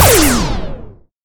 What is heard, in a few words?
laser; weapons